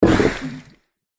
turtle wound

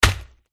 mage female attack contact middle

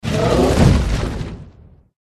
taran attack voice bottom

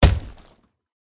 warrior attack contact up

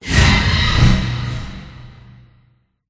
bat attack voice

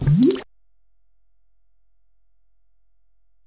magic drink

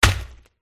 attack contact middle

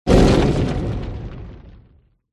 taran wound

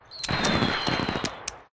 Deer; Pet

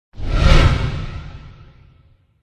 dragon boss buff

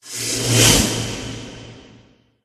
bat

